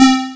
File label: SQUARE; Descent II